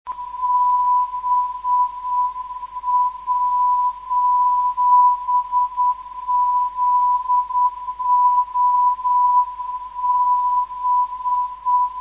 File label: bp 362khz, NDB